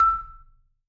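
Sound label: Marimba medium F E5, marimba; MRMBA MED F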